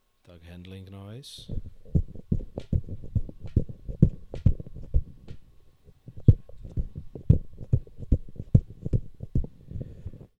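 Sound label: vocal, Handling noise, e945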